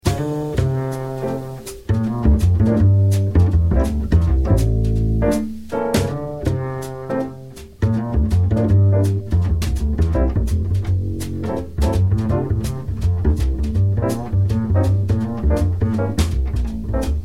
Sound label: minus melody